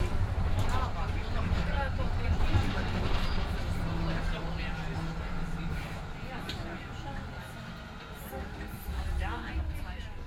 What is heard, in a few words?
In The Tram; Line 708